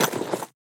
miner finish; idle mining empire

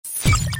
powerup

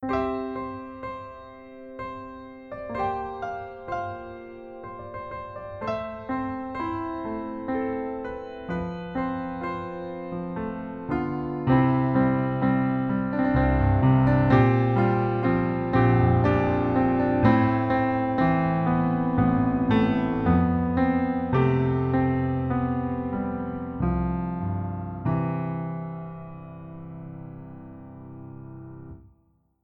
Hammer

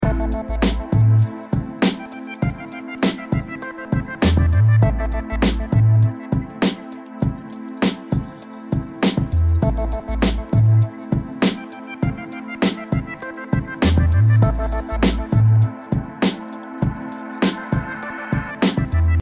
bg music; airplane

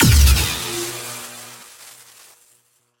Fire Laser

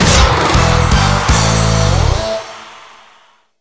music

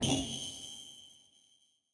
Spawn Zone Collapse

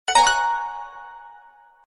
info

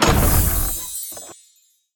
box Open; jumanji

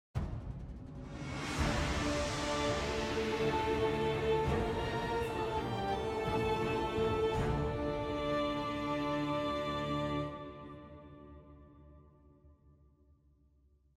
success oneshot; beasthunter; everdawn; quests

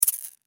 leave, chat

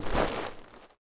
Gravel step

